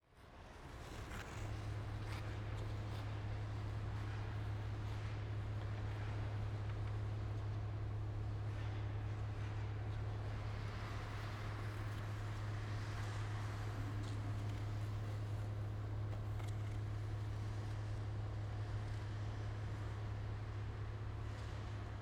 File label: Ambience Ice Rink Two People Skate; AdobeCC